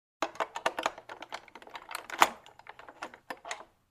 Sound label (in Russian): zvuk dvernogo zamka